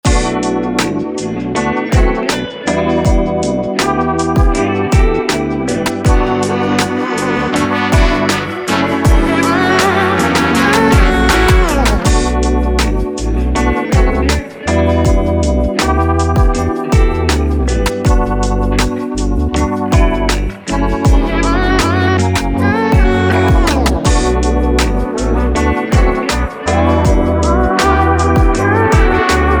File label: Wurly Walk